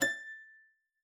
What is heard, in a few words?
HARP G#6; Xsample VOL-8; HARP P+MF